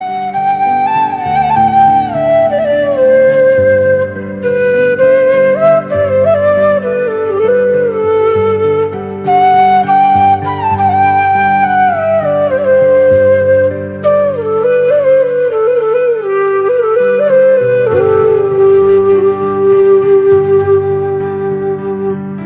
flute; 96

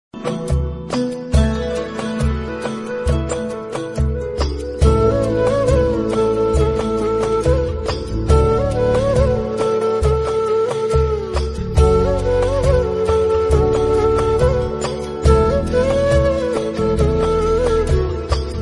flute ringtone

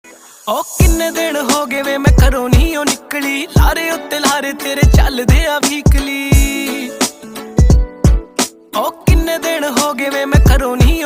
song, panjabi

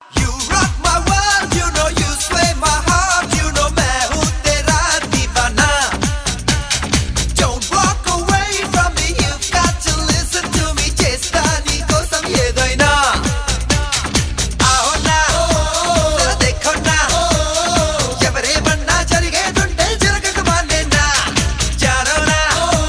New Telugu Ringtone

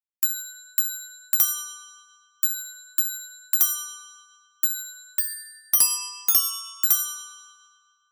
slow jingle bells

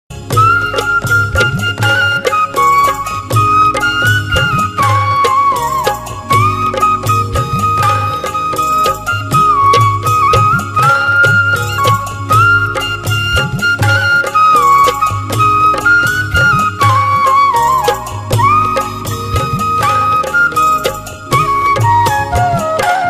Flute Ringtone

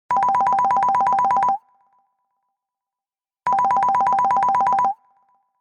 soft digital

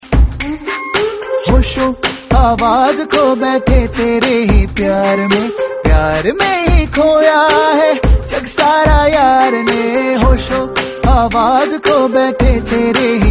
hindi new song ringtone

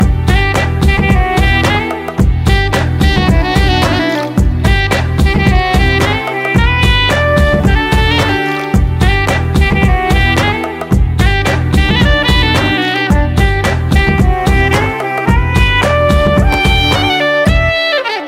Instrumental Telugu Ringtone

